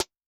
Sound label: JNGL SN, Snare; Yamaha DTXpress-II